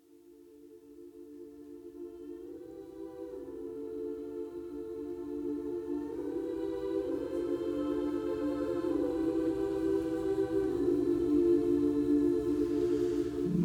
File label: Storm Coast Explore; Storm Coast Explore Intensity 25